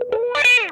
MAD CAT, Funk Guitar; Zero G - Funk Guitar

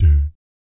DOOT D2, Jazz; ILIO; Vocal Planet; Jazz & FX; JAZZCOMBO

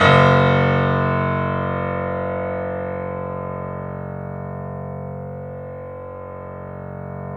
F#, The Piano; InVision Interactive - The Piano

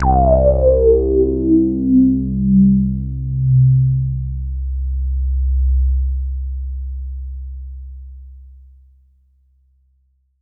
pad c2, Synths; Polyend; Simulacrum Sounds; 1 Shots; ARP 2600; Pads

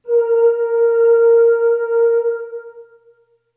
Ooh Short A#3 Short Reverb; Harmonic Building Blocks

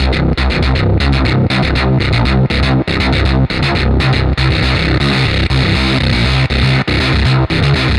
Brutal Bass; Sonic Foundry (Sony Creative Software); The Electronic Music Manuscript Collection; Bass Foundations

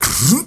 male solo, male noise

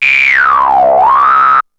ANALOG, SFX; Syntec; Wall of Sounds; TECHNO-BOX

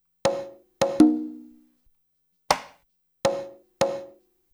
loop, congas; InVision Interactive; Percussion Slam

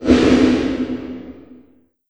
LH S909 -L, REVRB TR 9+8 LARGEHALL909

